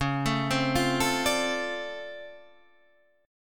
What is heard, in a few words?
D-Minor 7th C sharp, chord